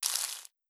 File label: leaves